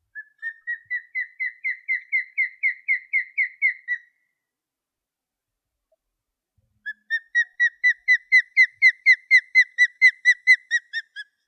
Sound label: Osprey Christie lake; clean